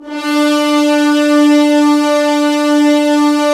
Horns; Roland - LA Composer vol. 4

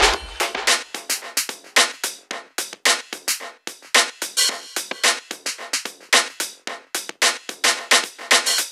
junk hip hop 110bpm

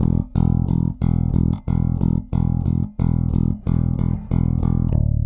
Rock, Bass; Bass X

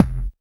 BD, Bassdrums, Roland TD-20

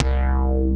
BASS, Drum & Bass, 170 BPM; Ueberschall; Drum & Bass Flash Trackz

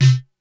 Snare, Korg DSS-1 Drums

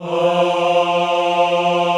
AHH F2, Extended Classical Choir; Best Service

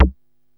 JU 106, SEQ-FX; Masterbits; Vintage Voltage

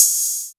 HAT, Techno 138 BPM

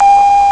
tuut; Amiga Soundtracker Sample Packs

